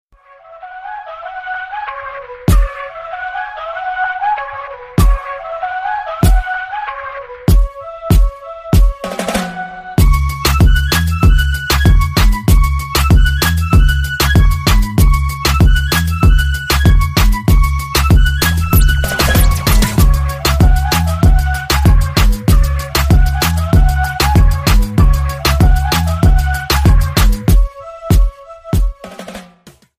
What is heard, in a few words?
instrumental ringtone